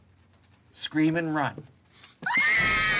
cat scream and run

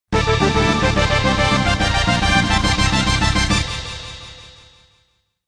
fanfarre

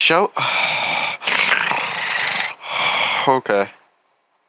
growling

